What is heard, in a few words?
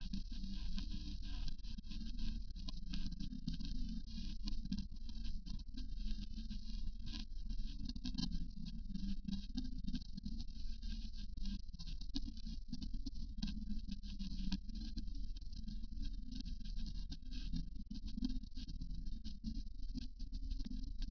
Jazz